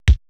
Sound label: Procussion; Best Service ProSamples vol.55; Retro Sampler; EMU E-III; Procussion Mix